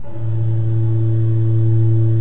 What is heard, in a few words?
KOPPELFLUTE A1, Cathedral Organ; Propeller Island